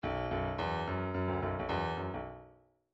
bdf midi file grooves funk l funk l

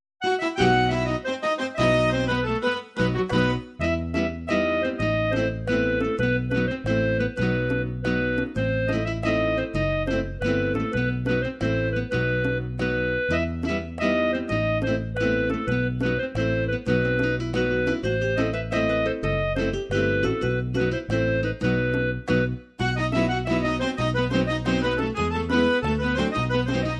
folk makedonia narodno, midi

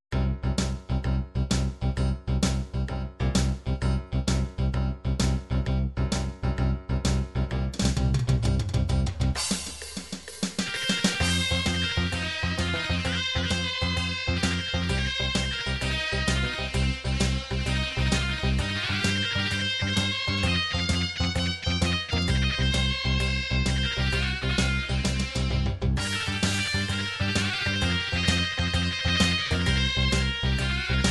4/4 blues, midi

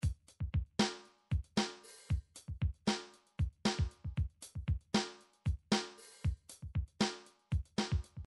midi drums funk

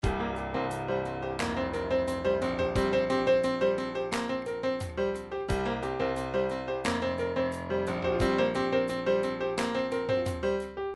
triphop waves, midi; Newtronic Triphop Construction Kit

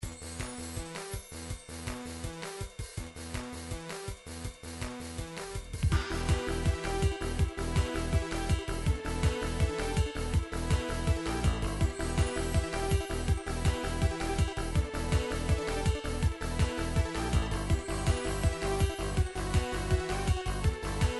trance, midi

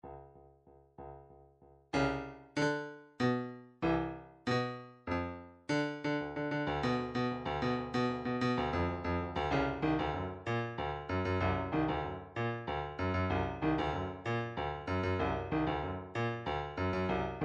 odd meters; Drumtrax Library 2

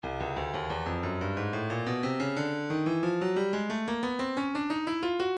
breakbeat 090 bpm hats; bozzy hats; Stylus Breakbeat Midi Files

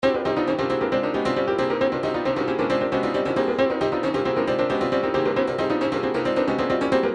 trance midi loops riffs; newtronic trance constuction kit

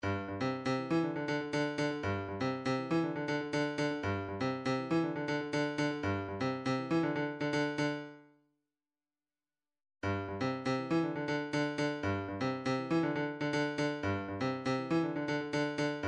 drums

